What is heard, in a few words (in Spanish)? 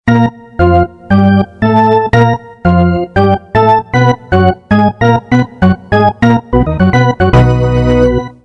hockey charge, El típico sonido de organillo de los estadios de Hockey